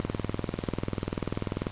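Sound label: Ambience Static Loop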